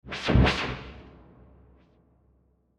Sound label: Hit Trembling; Hit Whooshes